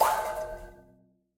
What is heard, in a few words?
Lab Giant robot Shot; Super Mombo Quest